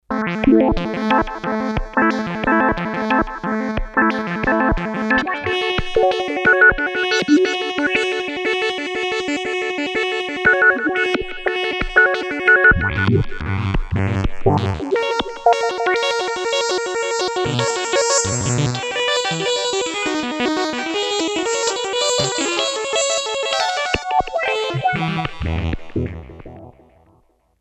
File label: LFO Flow, M-Audio Venom